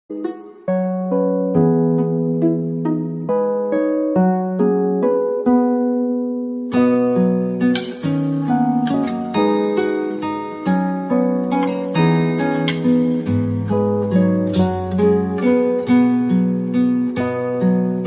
Rabindra Sangeet ringtone instrumental bgm music tone Bengali tone